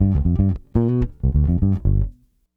Bass, 70s Analog Funk; Sonic Foundry (Sony Creative Software); What it Is! 70s Analog Funk

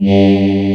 VO G2, ANA VOX, SYNTHESIZER; AKAI S6000 CD-ROM - Volume 1

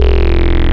Quad Filter, wavetables; Animoog

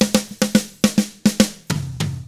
POP ROCK, Drums; 105POP ROCK

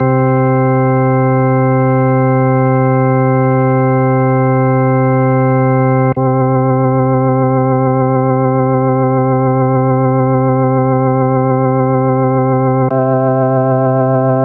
Keys

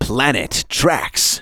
vox; Ueberschall; Techno Trance Essentials

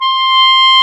CLAR, Clarinets, Orchestral Winds; Clar Cls; Roland LCDP04

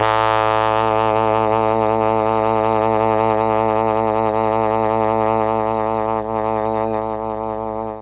A1 TRMB TRMP, Mellotron; Mike Pinder - Mellotron Archive CD; TROMB+TRMPT

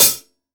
HH, PS 2002 HH

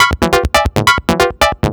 138 F, hi-tone-synt, Techno; instrument-loops

